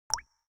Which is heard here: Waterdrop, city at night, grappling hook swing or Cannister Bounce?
Waterdrop